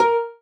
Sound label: marimba rainbow; ゆめ2っき